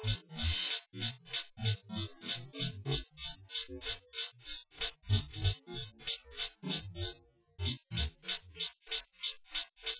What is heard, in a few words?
wdrums inst sim; SASSEC07